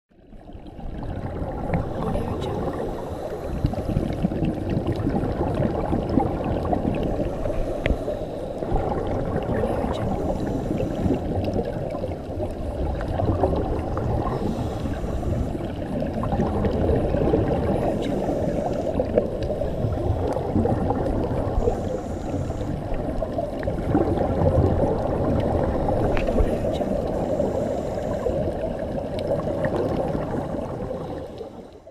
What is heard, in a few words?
scuba dive underwater